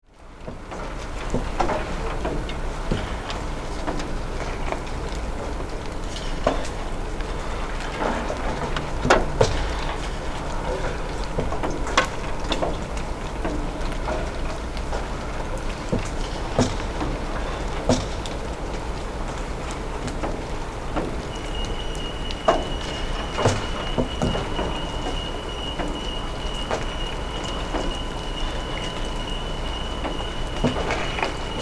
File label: night street rain